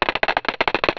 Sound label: tick